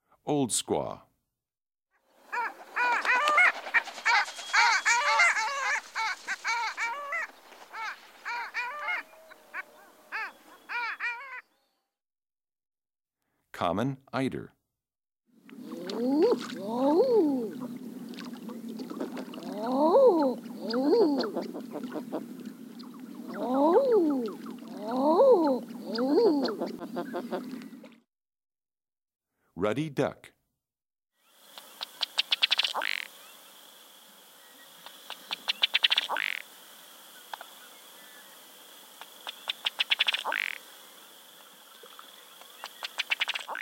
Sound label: Long-tailed Duck, Common Eider, Ruddy Duck, American Coot, Common Gallinule; Eastern-Central